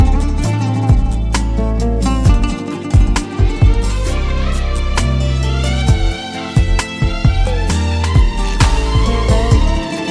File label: Violin Oud